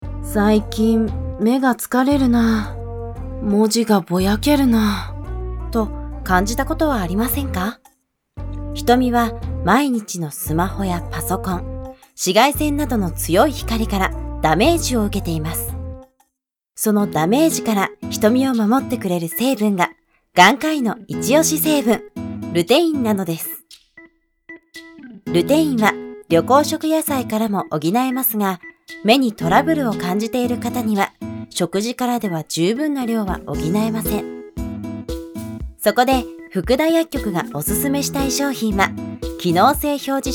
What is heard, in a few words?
bgm